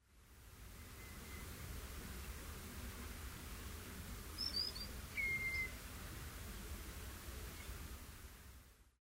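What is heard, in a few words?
common sandpiper redshank nocmig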